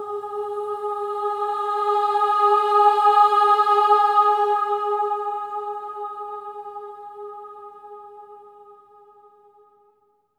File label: AH G#4, swell ahs, Symphony of Voices; Spectrasonics